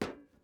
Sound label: metal step; Teardown; PS5, Windows, Xbox Series X/S